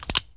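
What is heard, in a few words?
thermal click; MOTS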